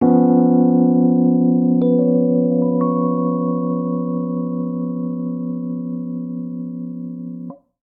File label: Ending Mysterious F Minor7b5 90 bpm, Electric Keyboards; MIDI Clips; Ableton; Factory Packs